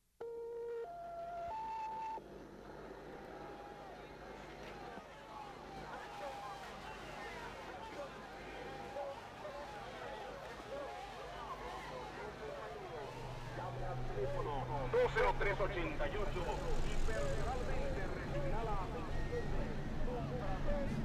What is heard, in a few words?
Tango; Instrumental; Experimental; Hard; Acid